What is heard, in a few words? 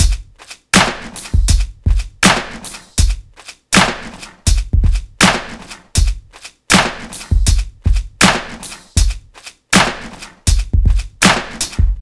80 bpm drum loop d sharp key